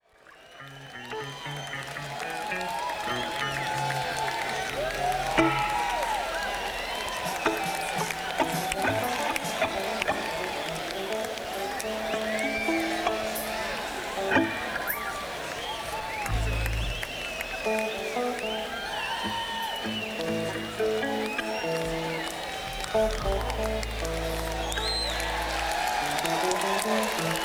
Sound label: Crowd Tuning